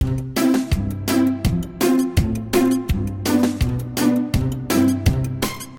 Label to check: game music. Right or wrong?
Right